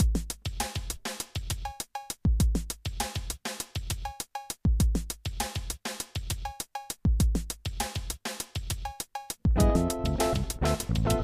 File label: backbeat